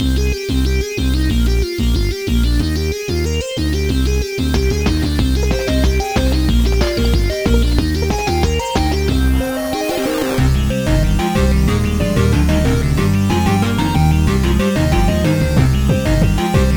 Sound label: Instrumental; Enmity